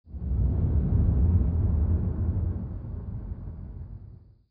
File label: Storm